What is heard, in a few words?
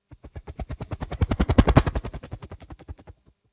helicopter flying by